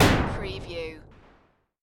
Retro Scifi Hit

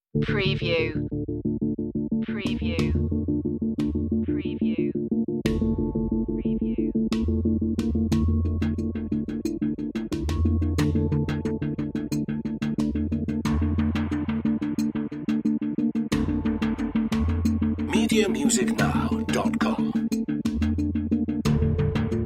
no melody

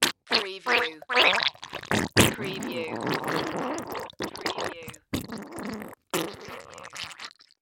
Diarrhea Wet Squirt

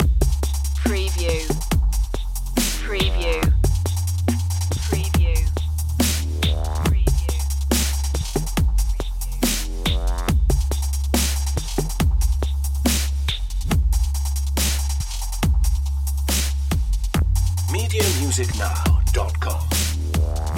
oceanic loop

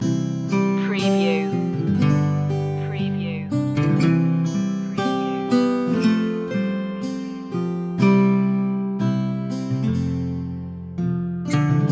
christmas spirit loop; Acoustic Guitar

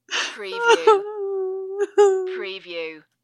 Female Short Sob

